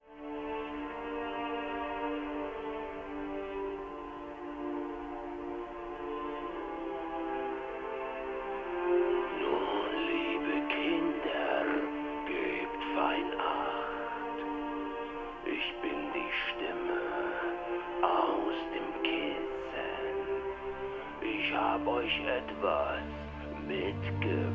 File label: Yamaha MSP5 Metal; studio monitors